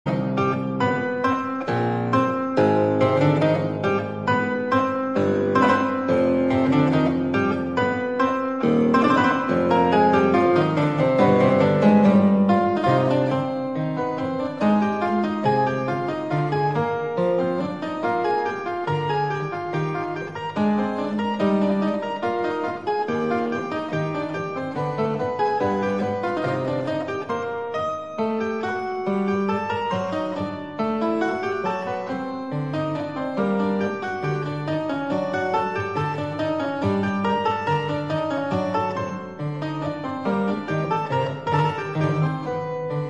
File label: bach prelude lute harpsichord; Prelude, Partita in c minor BWV 997